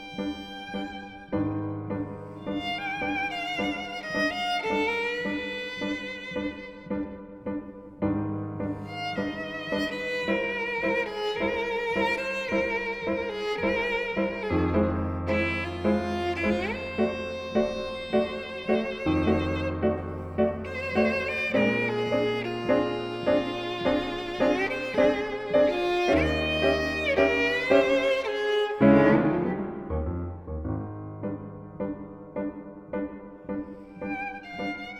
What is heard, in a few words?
Violin and Piano, Blues; Ravel; Sonata for Violin and Piano in G major II